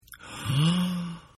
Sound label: Gasp